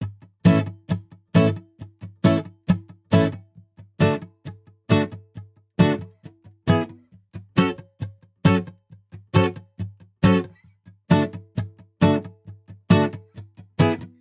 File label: Guitar Bpm135 Key A, 70s Guitars; Fox Samples - Back to the 70s Guitars